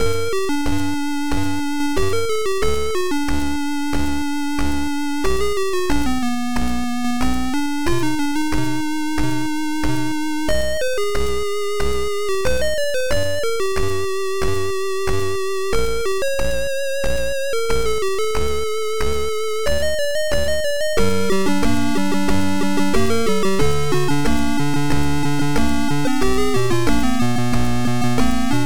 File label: techno